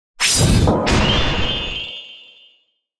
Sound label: ranged magic missile complete 05ft; psfx